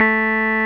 Roxichord, E.Piano

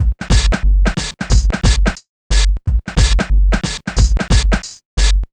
090bpm, Downbeat Dance Grooves

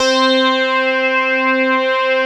JUNO SOLO, JUNO 106; Greytsounds; JUNO 106-60

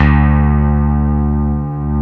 CP70 G3, YAMA.CP70; East West; Global Sounds

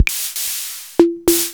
Rhythm-Generator; Watford-Electronics

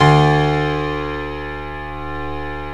PIA SYN; Best Service Gigapack 1

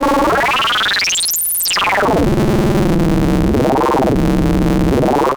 Osc vs Ring Mod, vintage oscillator; radiosonics

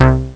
Solo Bass, Amiga Soundtracker; ST-XX Amiga Soundtracker Sample Packs

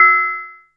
DULL, PERC HITS; Zero-G Ambient 1

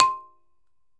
BALAFON

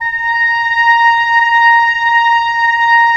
Oboe; Roland - LA Composer vol. 4

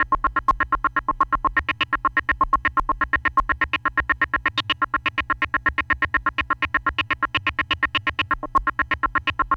TEK NOISE, SYNTH SEQ

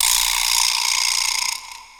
Vibro Punch; Sonic Foundry (Sony Creative Software); Electronic Point Blank; Drums & Percussion

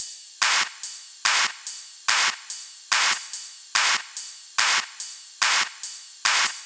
144 BPM Loop, Drums & Perc; Vengeance - Essential Clubsounds Vol.5; Flight Kit Root Amin

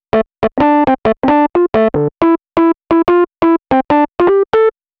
Rhodes Solo, Cinematic Funk

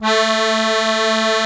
ZUPAN CHRO, AKKORDEON

